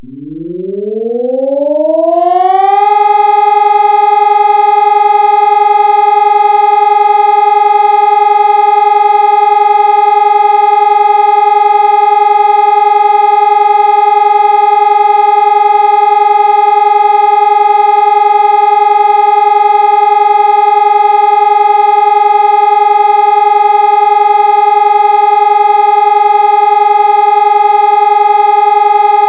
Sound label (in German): Entwarnung; Warntag; Landkreises Emsland